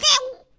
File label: Bird Collision; Angry Birds Seasons